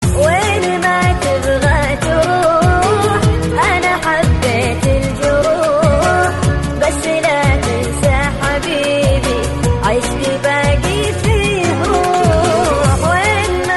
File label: arabic ringtone